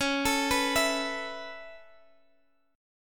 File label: Db Minor 7th Sharp 5th, Guitar, Standard; arpeggiated; x,x,11,14,12,12